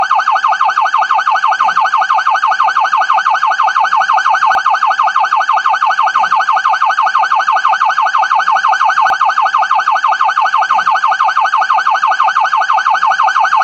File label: police sirena; deathmatch; [BVR]Sirene; POLICIAL